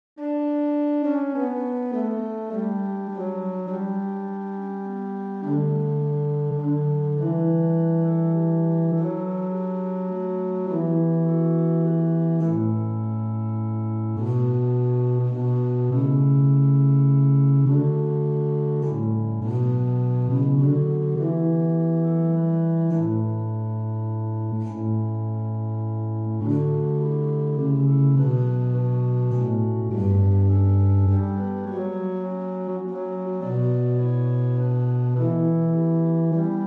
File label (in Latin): Adsum Domine bass